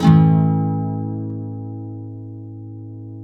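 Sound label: nylon guitar up major A#, flam chords; Spectrasonics; Hans Zimmer Guitars VOL-2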